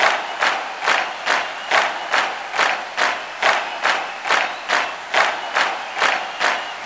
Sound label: Crowd Effects